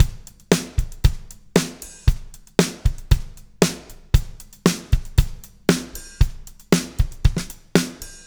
MED POP, Backbeat, L

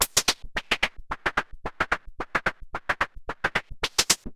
MiniBrute 110BPM, Gated Seq Noise

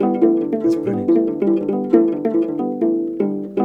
HRP AF HRP, Afro Harp Phr; Roland LCDP11; Africa VOL-1; Afro Harps

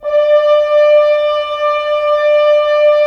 Horns; Roland - LA Composer vol. 4

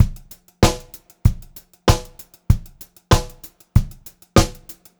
VARI, Pop-Rock Drumloops; USB Soundscan vol.37; AKAI

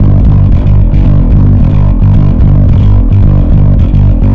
Bass, Distorted G; Bass X

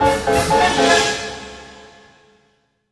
HIT, orchestral; Orchestral Loops; FULL ORCH ACNT

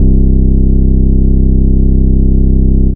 Single Electric Basses, Dance Floor Bass

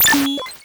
Cwejman S1 - Button Nav

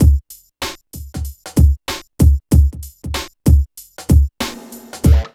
DRM LP; East West - Phat & Phunky CD2; 90's Sample CDs

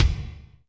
Kik Hanger, Alesis D4, Drum Machines

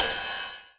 909 Ride

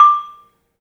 MARIMBA; 3D Sound; 3D Keys VOL-1

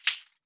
JINGLE CLAP, JINGLE BELL; AKAI S-Series CD-ROM Sound Library VOL-5

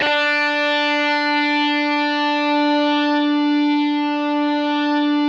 DIST GUIT, GIB SG, D4

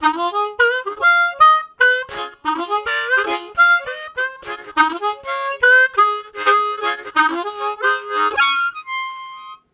Jumped, Harmonica Riffs; Zero G

